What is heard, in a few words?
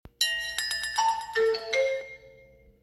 Chrome Alert